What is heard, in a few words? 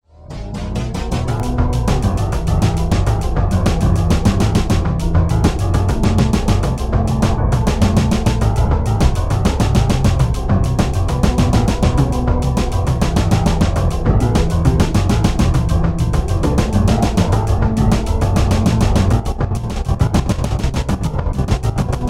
procedural music